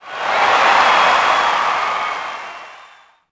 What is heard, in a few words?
POS Reaction,Crowd; Cars; XBOX